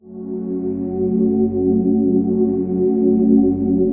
122 BPM Pads A maj F min Tonic F, ambient synth pads; MMB